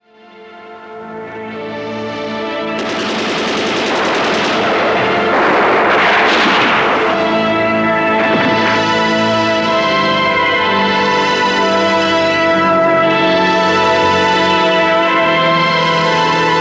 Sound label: Synth Score